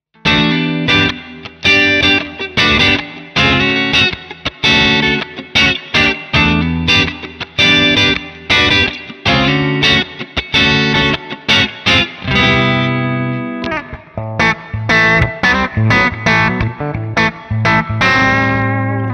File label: Clean Amp; Gojira Plug-In Suite